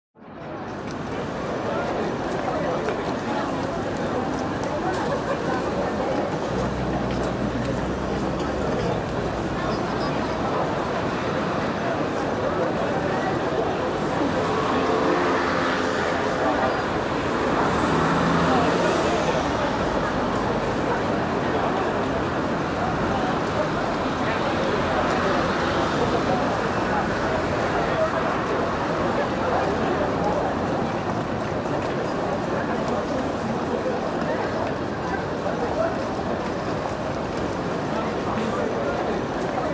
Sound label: City Ambi Street